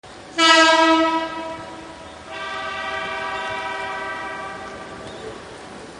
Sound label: air & elec Hon